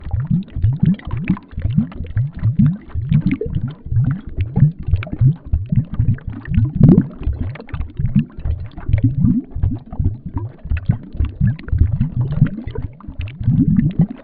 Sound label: ambient lava